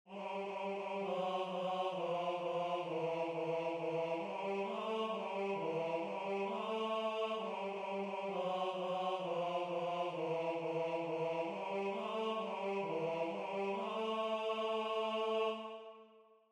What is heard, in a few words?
la sauterelle tenor; poulenc